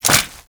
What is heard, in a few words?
Latch; Warframe; Grineer; Royal Jester; Foley; Jumps